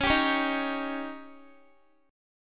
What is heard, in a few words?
C sharp Suspended 2nd Flat 5th C sharp Guitar; C#sus2b5; strummed; x,16,17,18,15,x